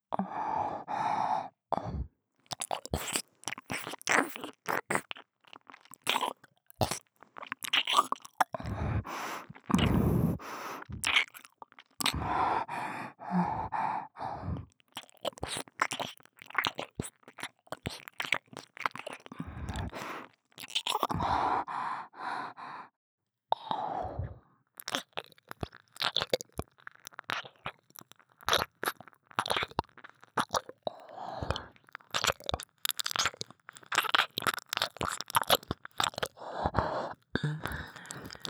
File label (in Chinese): 舔耳(右); 低音ボイス奴隷執事。ZWEI